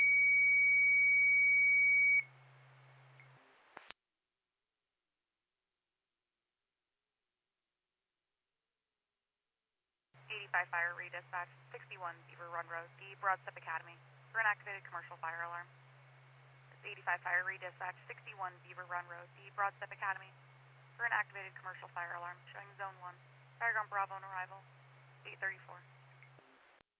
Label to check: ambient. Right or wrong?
Wrong